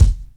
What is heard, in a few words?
short steel kick drum f key